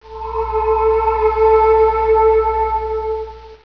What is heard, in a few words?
Syn Breth Pad